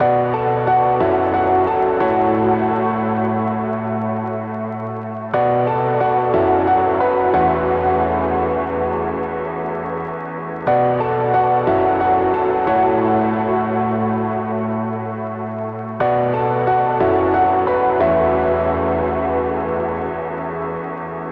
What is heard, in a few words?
Lofi Melody Loop, 90 BPM A Maj; Cymatics - Lofi Melody Loop 9